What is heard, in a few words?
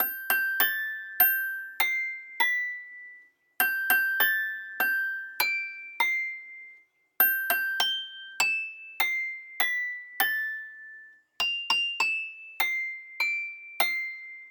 Happy Birthday Music Box Loop